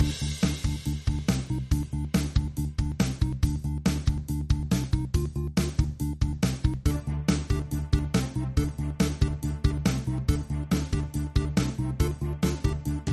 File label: Battle Music; dragon city; Videogame